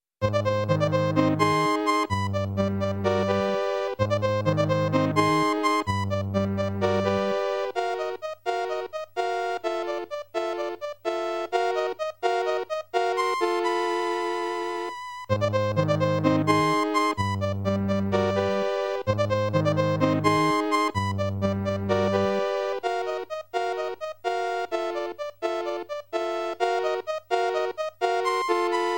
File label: Forest